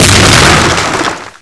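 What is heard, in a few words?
grenade explode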